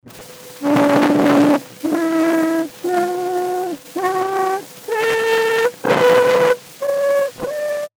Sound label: Vocal Scale